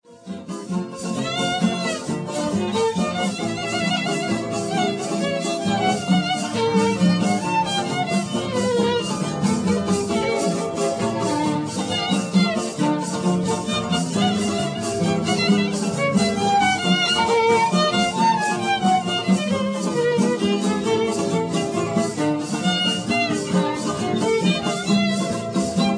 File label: mazurka